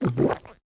gulp; superheroes2

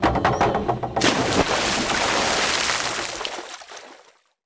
ground pool tap; martha quimby

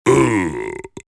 lieutenant death; ea tsto

